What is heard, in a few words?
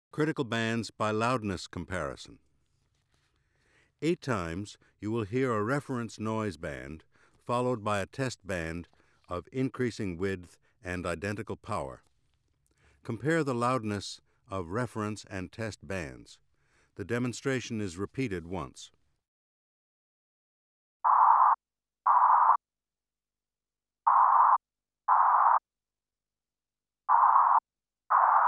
Critical bands by loudness comparisons, Auditory Demonstrations